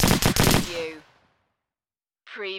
Machine Gun H K MP540 Short Burst Triple

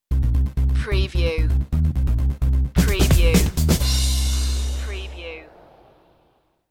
stinger

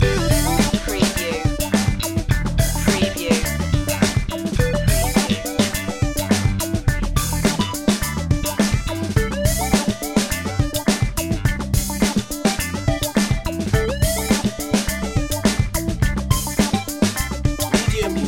do it funky loop

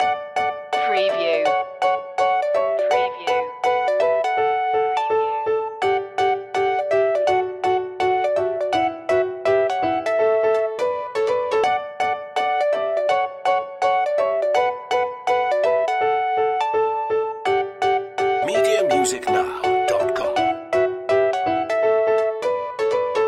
happy explainer loop

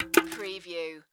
Garbage Can Throw Trash

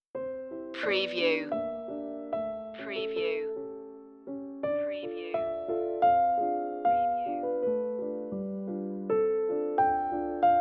tchaikovsky sweet dreams piano

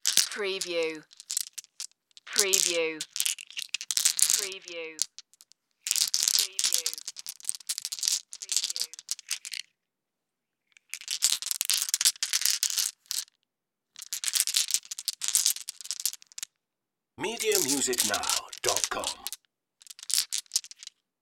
handling marbles

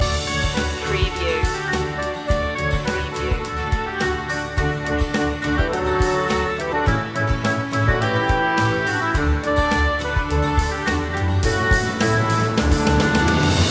orchestral loop; joy to the world; media music now

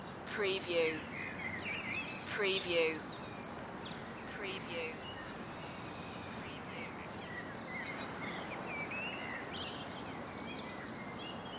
Residential Morning Birds Loop

